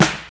jazz steel snare drum sound E key, acoustic snare sound